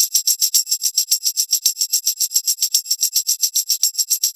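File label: percussion, Roots Funk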